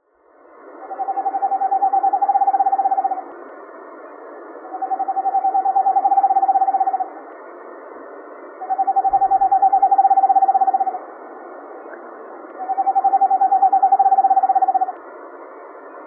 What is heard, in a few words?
eastern screech owl high monotone whinny; mike nelson